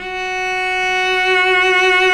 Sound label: F#3 SOLO CELLO